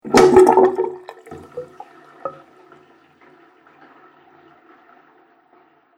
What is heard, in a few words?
Water - Pull The Plug Noise; Bathroom Sound Effects